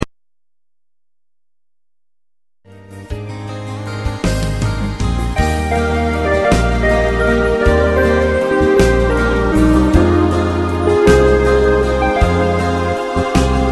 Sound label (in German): schlittenfahrt, winter